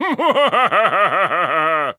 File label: Wizard Laugh